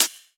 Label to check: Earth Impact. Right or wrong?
Wrong